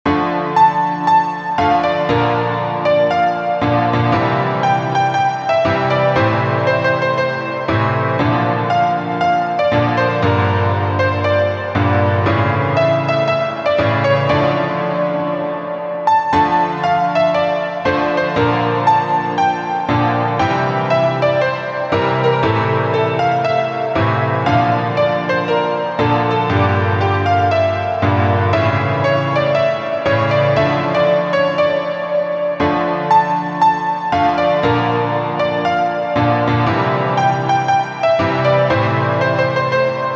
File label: instrumental